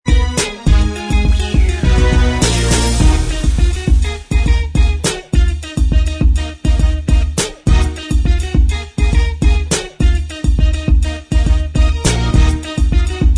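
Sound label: instrumental track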